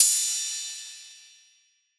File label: Drift Crash; Cymbals